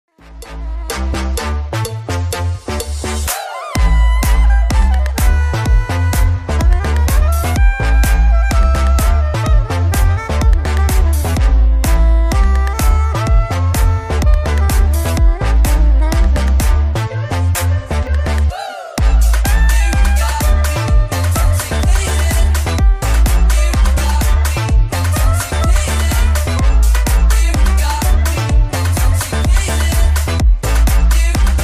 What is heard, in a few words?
sax freestyle